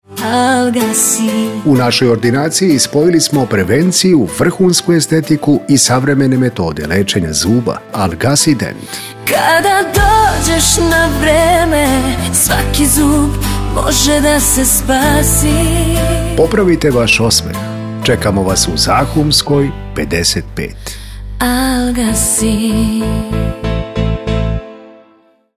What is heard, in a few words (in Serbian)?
stomatoloska ordinacija zvuk reklama pevana